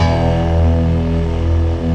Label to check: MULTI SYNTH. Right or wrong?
Right